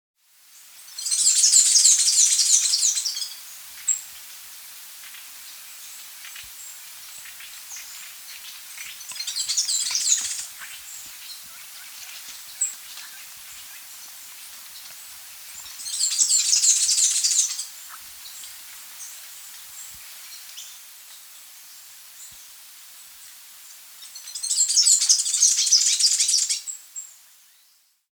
Black-Eared Hemispingus (Hemispingus Melanotis), Andean Birds; S. America